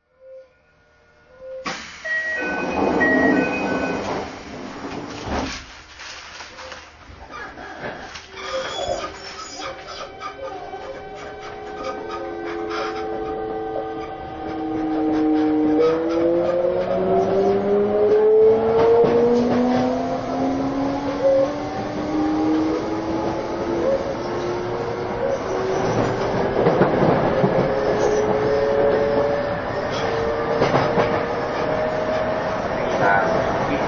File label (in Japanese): 電車走行音, ＶＶＶＦインバーター：三菱製ＧＴＯ, この車両の音の大きな特徴はなんとも言いがたい大きな非同期音です。; 八広〜曳船; 都営地下鉄浅草線５３００形; 非同期音が大きいことから吊りかけモーターの電車の音にも似ている